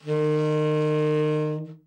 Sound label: TEN SFT, Sax; TEN-SFT SHRT; Giga Samples Collection